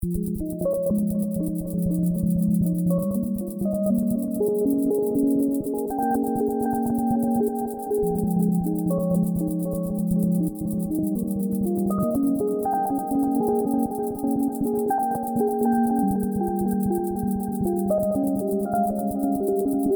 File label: STRINGS